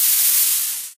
sentry upgrading steam, weapons; tf

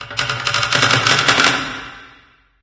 amb wood creak, ambient organic; source engine; portal2

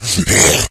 rage, infected, male

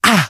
hurt minor, survivor voice, manager; source engine; left 4 dead 2

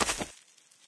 dirt, player footsteps

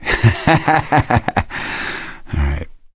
positive noise, gambler, survivor voice; source engine; left 4 dead 2

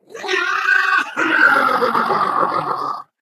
ballbot jump

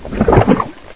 sack; video game; source engine; hl1; gonarch

